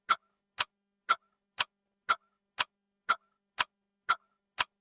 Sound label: Tick Tock Clock Sound; facts channel